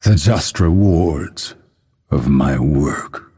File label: death; dota; phantom assassin